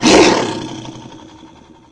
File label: angry, antlion guard; hl2